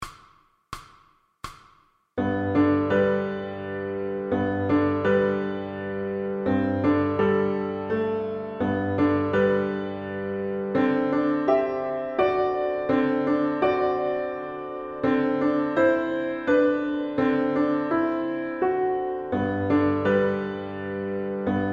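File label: Violin Scales; Pre-Grade Higher; piano accompaniments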